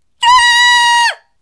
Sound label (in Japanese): voice himei